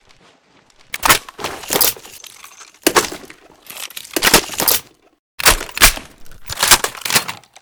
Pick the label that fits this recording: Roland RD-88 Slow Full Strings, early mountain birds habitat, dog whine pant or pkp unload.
pkp unload